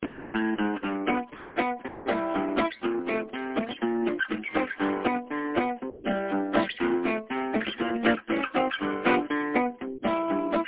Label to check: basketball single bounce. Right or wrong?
Wrong